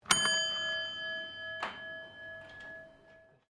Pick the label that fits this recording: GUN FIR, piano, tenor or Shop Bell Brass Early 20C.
Shop Bell Brass Early 20C